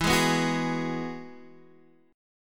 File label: E Major Add 9th, Guitar Half Step Down